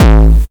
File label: KICK hardcore, Techno Trance DRUMS; Techno Trance Essentials